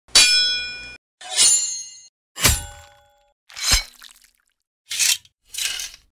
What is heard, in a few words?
Katana; کاتانا